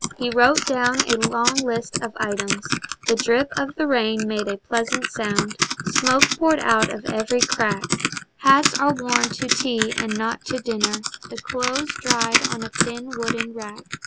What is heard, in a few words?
keyboard